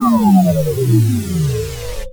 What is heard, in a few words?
Scifi Power Down; Energy & Forcefields